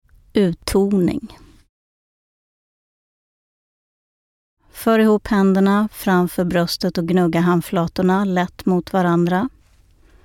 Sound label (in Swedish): Uttoning